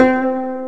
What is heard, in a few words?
KOTO C2; AKAI S-Series CD-ROM Sound Library VOL-7